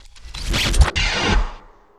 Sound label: Transition; FREE SFX PACK VOL 3